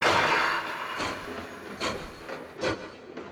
Train Start From Stop; Oculus

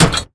metal sliding door close; Oculus

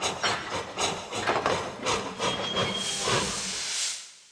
train stop from speed; Oculus

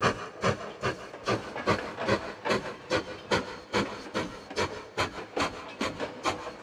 train speed loop; Oculus

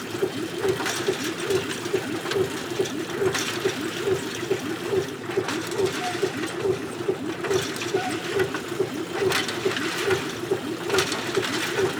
windmill rotate fast loop; Oculus

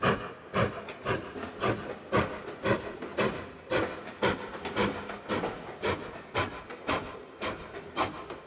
train speed loop; Oculus

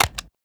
Button Down; Oculus

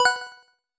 menu click; MI2Mario

